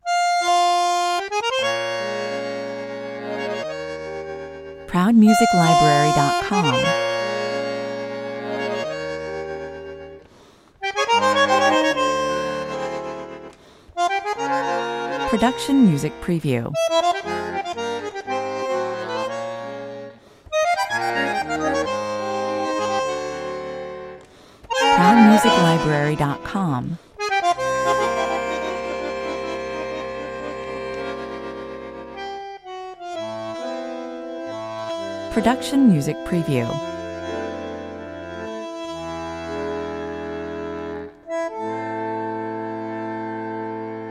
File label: bandoneon 98 bpm